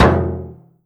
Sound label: impact, metal barrel